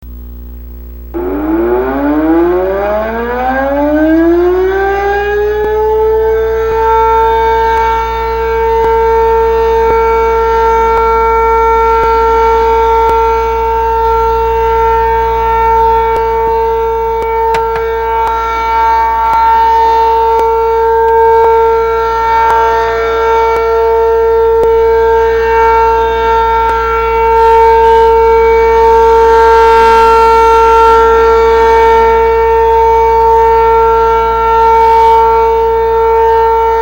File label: sirens; jumbo allertor; hoffman estates il